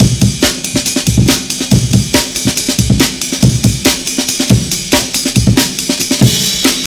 loop full, drums

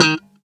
eb4 staccato, glowly bass

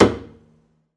pizz noise slap knuckle, double bass

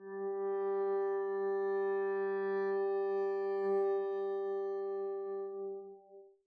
arco g3 pp up, double bass

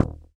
noise muted slap, swag bass

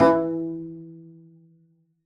pizz e3, double bass

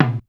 tom, drum machines, Casio RZ1

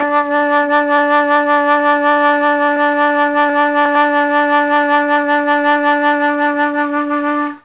D2 mellotron FLUTE

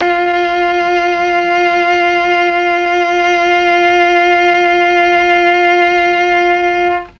F4 mellotron cello

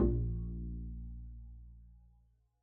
pizz bb1, double bass

